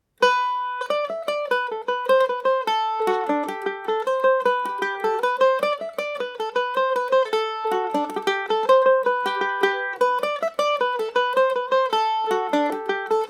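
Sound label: D mixolydian, Irish mandolin; mollie mccarthys no 1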